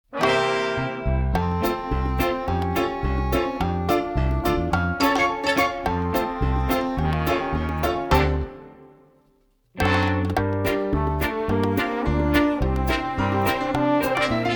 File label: instrumental